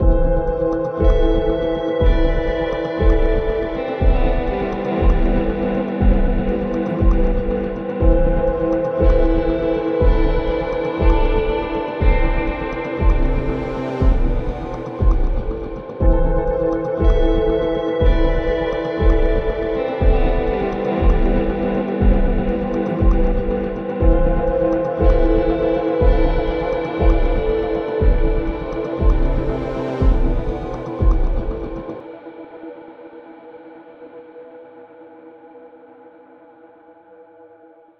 full instrume

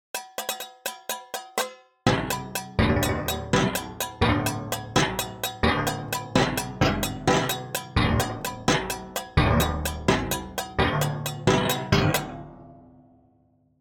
pitch example metal dry

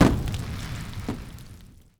frying fireworks; nova sound

